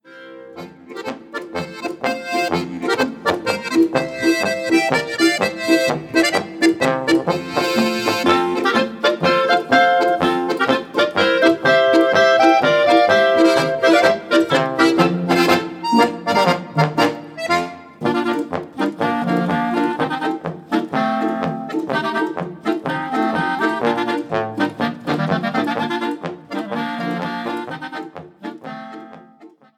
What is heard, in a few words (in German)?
polka, klarinettenmusi